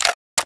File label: m4 mag in